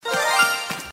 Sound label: shiny sound; pla